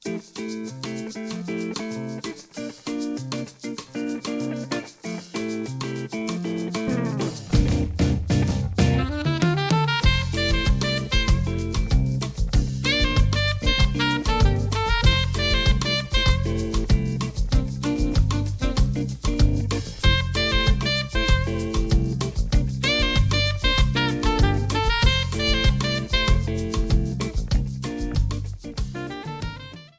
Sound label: Bossa Nova Arrangement; Choco Island